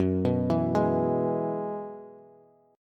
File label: Gb 9th Suspended 4th Gb down Bass, chord; Gb9sus4; arpeggiated; JGuitar